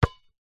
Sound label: basketball single bounce on court; sport